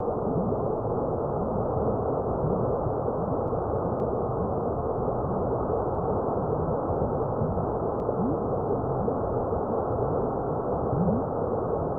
ambience cave underwater

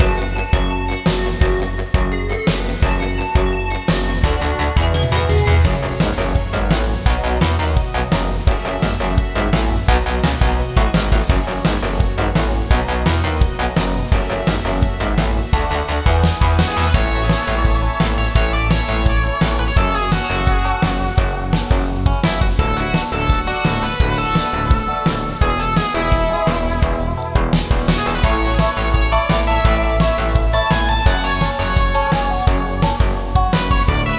80s Miniboss; Chillheim

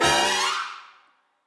Flashover

